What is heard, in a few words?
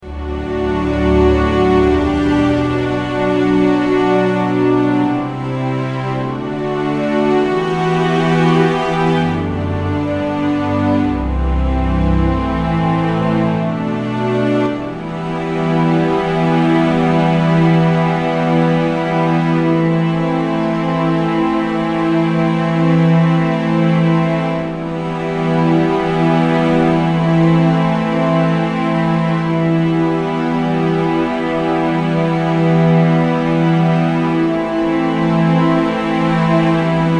Advent PAD; Worship Flow